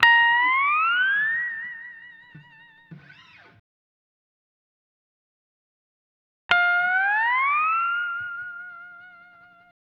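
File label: Steel Guitar A# Dry, Lounge; Zero-G Kocktail Kollection; Construction Kitz (Lounge); Volcano at Sunrise 074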